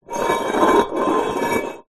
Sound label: household cup metal camping slide wood counter; zapsplat